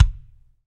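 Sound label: BD, bass drum, Real-Kit; 90 s Sample CDs; Sound & Vision - Gigapack I CD 1 (Roland); Real-Kit m15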